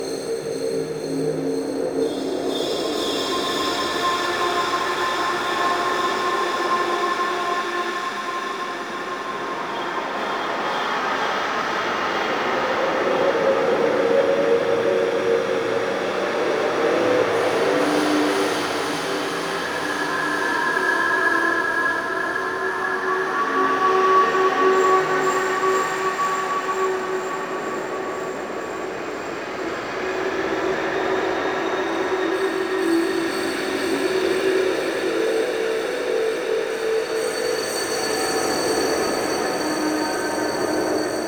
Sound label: Interior Stadium Bed Loop, Ambience; Nineteen Ninety Nine